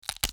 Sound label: Bone Break